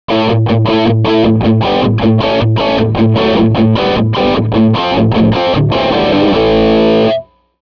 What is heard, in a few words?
Hot Rod boss ds1, pedales